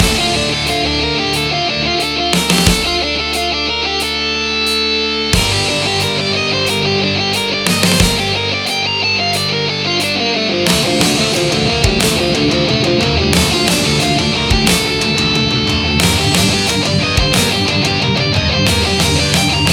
Heavy Riff (looped); Heavy Riff 1

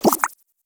Double Jump

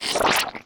Transform End

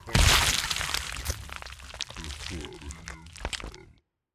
Kraken stab hard

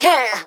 Pecheneg Rogue Damage

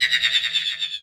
Spider Death Sound

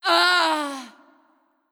Heroin Death

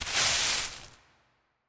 Broom

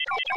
Beep-Red Rev

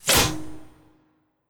Pistol Hero Helmet Launch

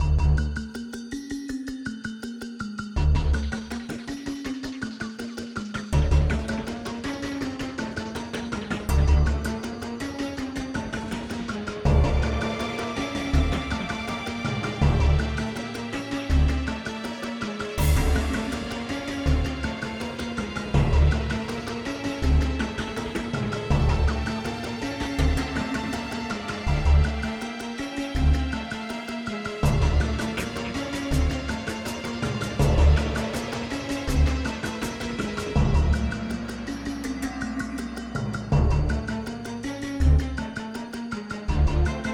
Event Suspense Light

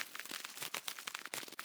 Move Loop; Hollow Knight; Mini Mawlek Move Loop

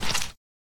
Bleeding

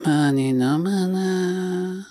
Distant Village Bug

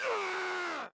Death Voice

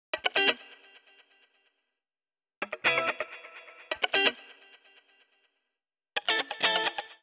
guitar atmo 16 beats

